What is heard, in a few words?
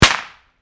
Firecracker Bang, Fire, Firework, Pop; BlastWave